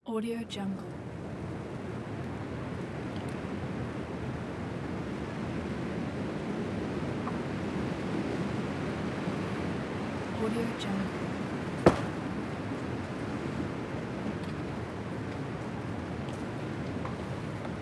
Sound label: winter ambience